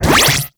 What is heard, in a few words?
Stop; Warframe; Weapons; Spectra